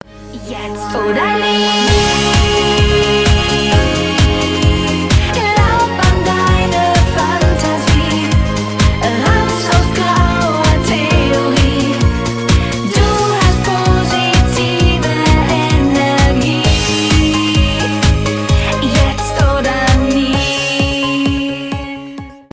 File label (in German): Gesang Song